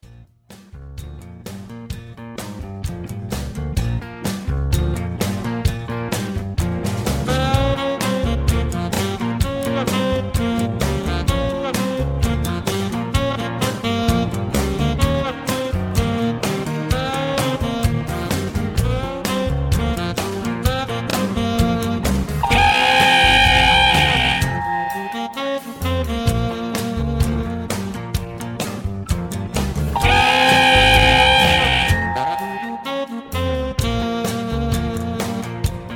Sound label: High Key